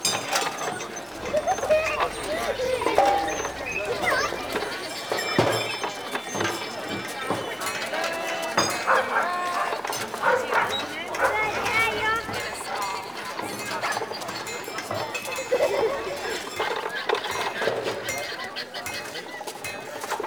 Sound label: Ambient Loop, Village Exterior; Warframe; Eidolon Plains; Ostron Village; Ambience